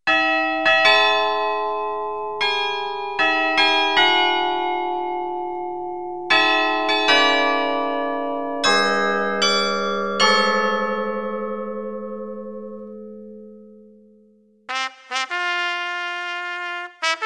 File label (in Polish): hejnal